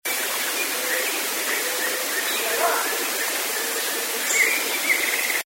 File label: voice, bigfoot